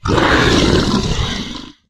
m flesh death; STALZONE

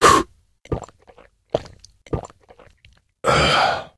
drink; STALZONE; minecraft

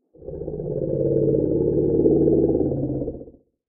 Small ambient fog; STALZONE

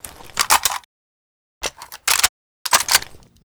svd reload; STALZONE

